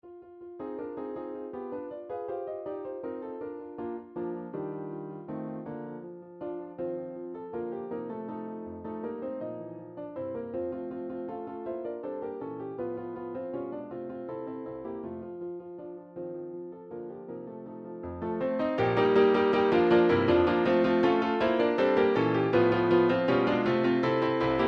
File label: German song, midi